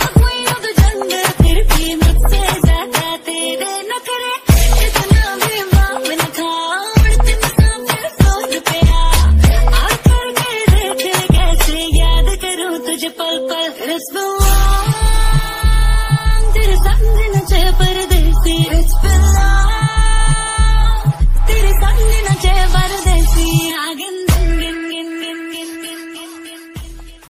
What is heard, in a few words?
female love ringtone, bollywood